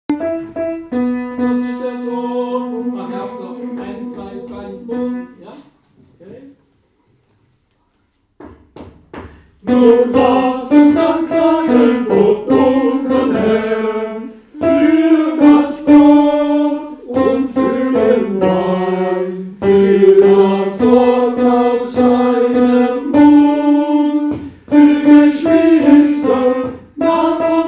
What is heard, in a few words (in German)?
Dankhymnus Tenor